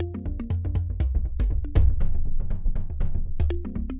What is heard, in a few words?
Mallimban C7 120